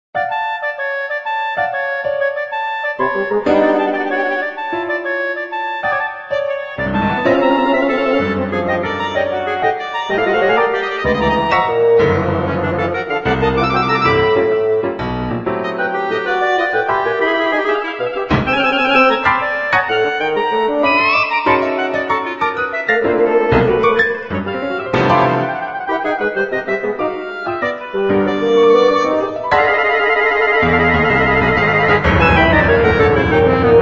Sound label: puzzle quartet